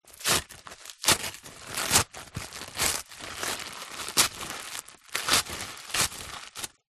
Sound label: unwrapping present; foley; zapsplat